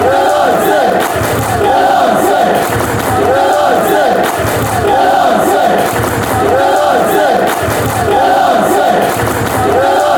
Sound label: united clap